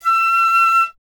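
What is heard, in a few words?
flute piccolo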